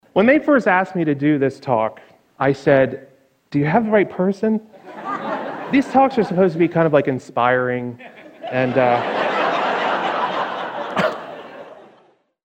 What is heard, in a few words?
LAUGH; TED TALK INSPIRING